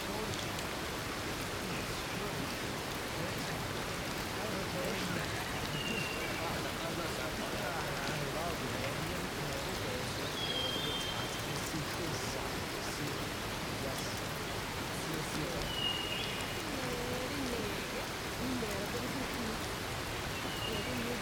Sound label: crystal falls